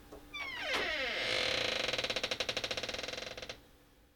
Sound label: Door Creak